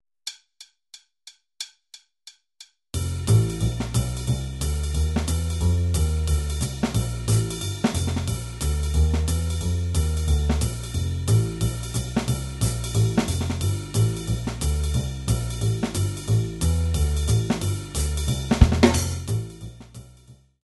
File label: sample blues